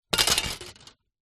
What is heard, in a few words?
plastic shards broken thin pieces set down; foley